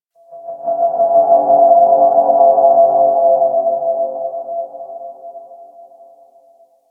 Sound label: Ambience Hub Hangar Satellite; Warframe; Tenno